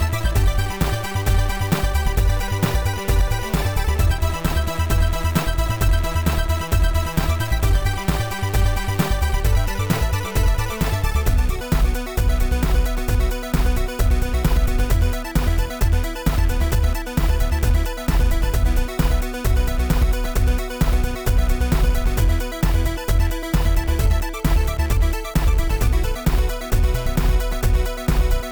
Pirate Keygen

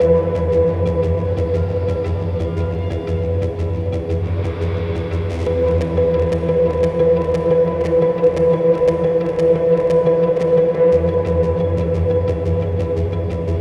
Naval Music; Battlefield 4

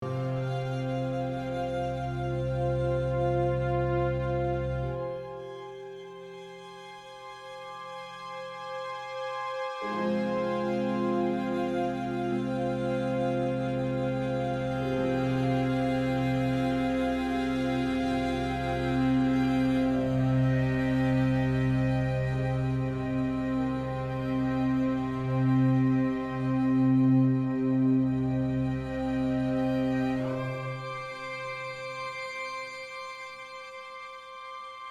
Sad (Loop)

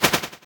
sword

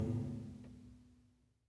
Loop Tail; Good Job

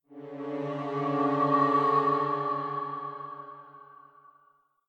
MUSIC HORN WRONG; adams venture origins

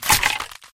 Bone Crack; doodle jump 2; android

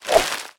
Dialog Close; Idle Champions of the Forgotten Realms

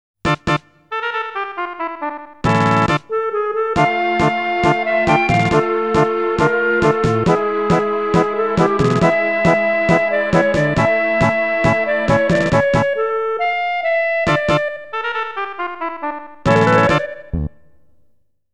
Tango; SaGa Series 20th Anniversary Original Soundtrack Premium Box; Tango Frontier

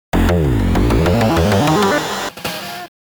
Jingles - Pet Evolve; Monster Tale; Nintendo DS

